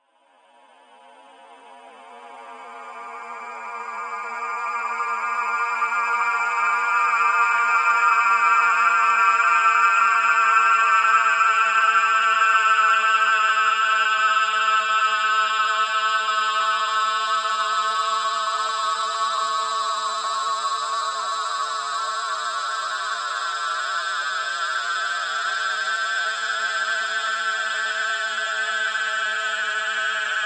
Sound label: Sample Upper Shimmer; SoundChaser; CerebralAudio